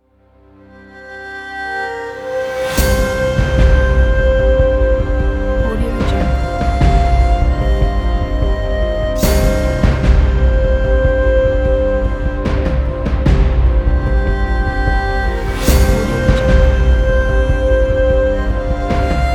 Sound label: asian epic